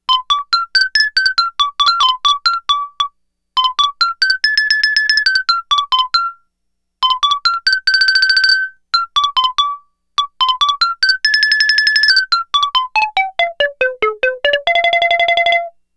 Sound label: Xylophone, Roland SH-2000